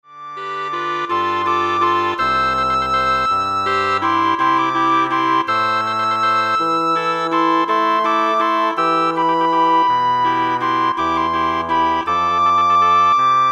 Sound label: Woodwind Ensemble